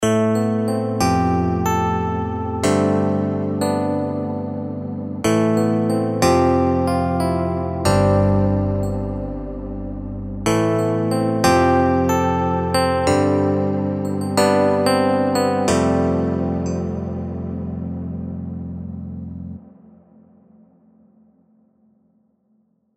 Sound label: mks80 no chorus; wingmen; morphoice